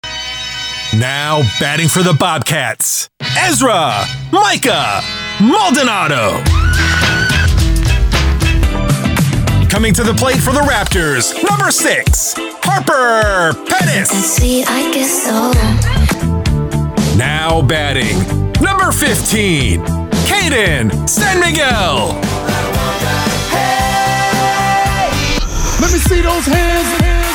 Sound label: Sports intros walk up samples